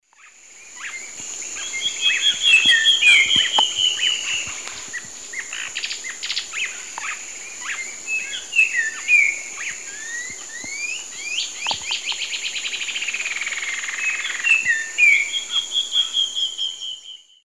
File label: Habia rubica, song; S. America; Eastern Lowlands